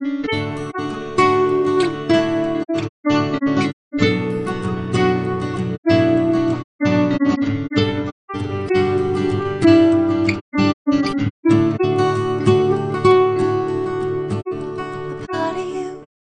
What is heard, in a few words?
Seed Noise solo